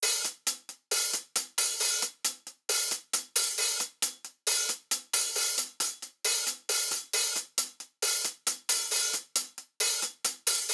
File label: Wonder Hi Hat, Closed Hats